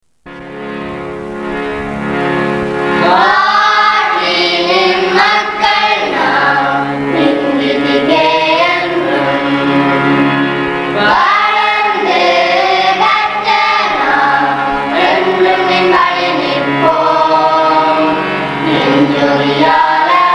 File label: Anthem Tamil; HartleyCollege